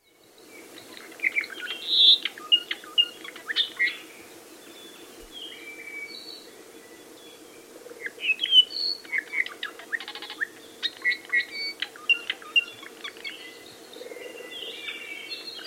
Apapane

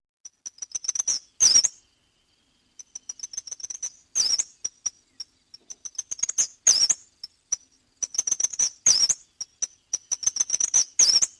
eastern kingbird

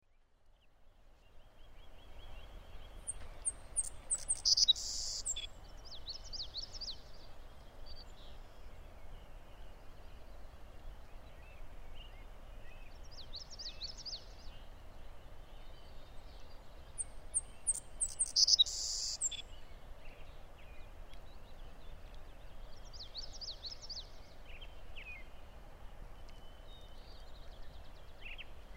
Savannah Sparrow

